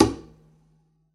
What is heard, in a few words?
pizz noise click, double bass; dsmolken; noises